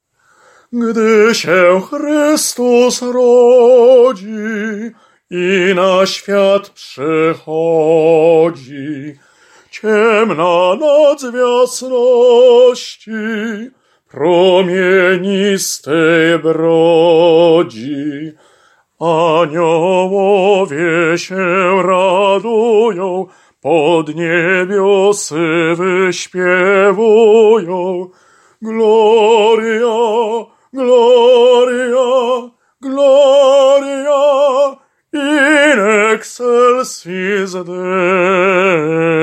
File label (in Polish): Sopran; Gdy sie Chrystus rodzi